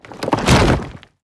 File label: eat tower fist remove; crocs; abominor